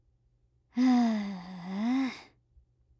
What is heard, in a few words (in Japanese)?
深い溜息